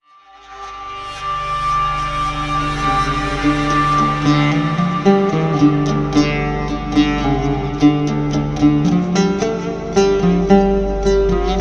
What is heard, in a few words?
middle eastern darkness; Sonniss GDC